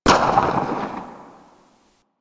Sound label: Tail, Weapons; Lato Vandal